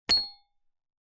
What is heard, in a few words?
leisure toy musical xylophone key plastic press single note